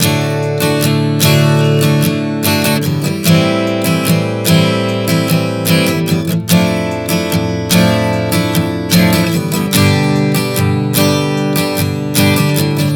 Progression 074 D-A-Fmaj13-G, Acoustic Guitar, Chord Progressions 074 bpm; Sonic Foundry; Songwriter Acoustic Guitar Companion